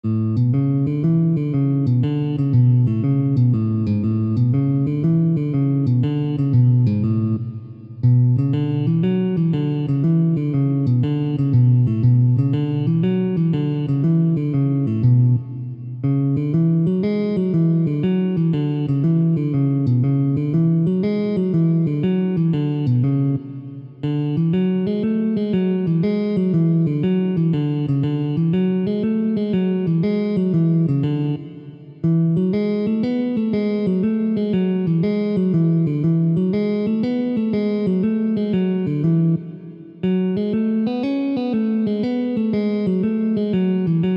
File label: Scale Snippets in A Major, guitar